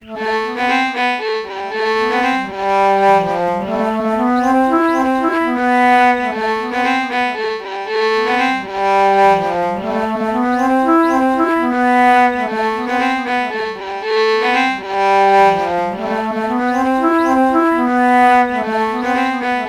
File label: alto flute violin melody morph low